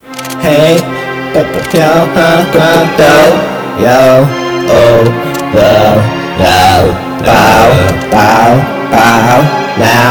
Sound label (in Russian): Kolonka BASS